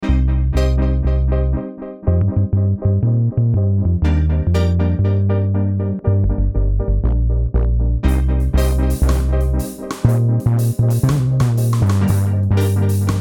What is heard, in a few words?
Dub; Big Tick; Rhino Electro